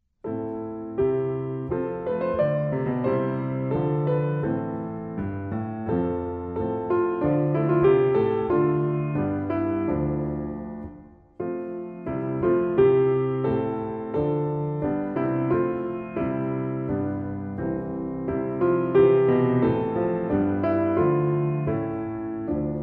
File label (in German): piano solo volk, piano volkslieder; Der getreue Eckart